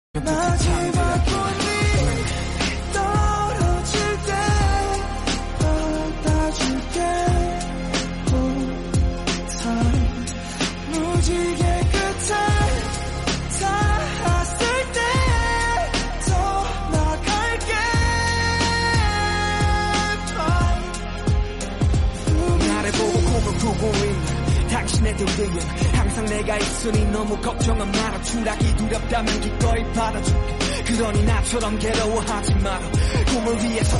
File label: korean song